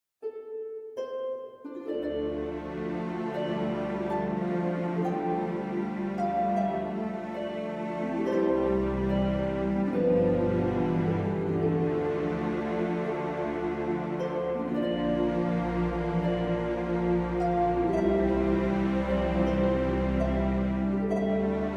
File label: Solo Harp; Mx Cue Birth Solo Harp V1